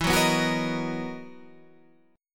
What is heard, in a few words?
B Major 7th E